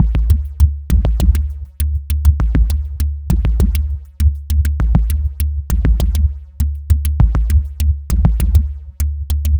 Timber Land, Ethereal Textures, Beats; Sonic Foundry (Sony Creative Software); Ma Ja Le Ethereal Textures

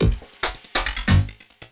LOOP, 140 BPM, Drum & Bass

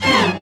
STRINGS; Houseworx

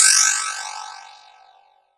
Sci fi

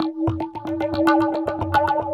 TABLAS; Zero-G; Chemical Beats

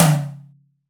Room Tom 3 alt, Roland BK-5 Toms

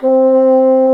WND BSN, Bassoon; Miroslav Vitous - Solo Instruments VOL-1

